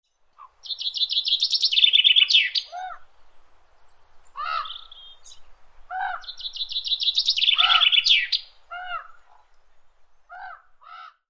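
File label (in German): Wald und Fels, Vogelwelt Pyrenäen; vogelwelt pyrenaeen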